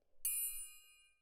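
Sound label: Percussion, Jazz for piano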